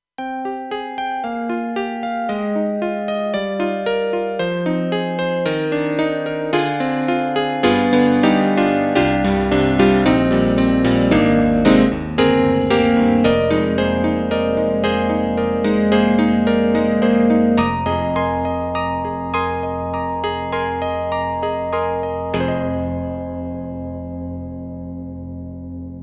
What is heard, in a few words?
KORG TR-Rack Electric Grand